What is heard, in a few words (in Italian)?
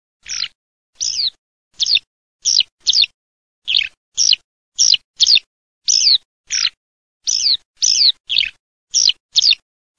ALLODOLA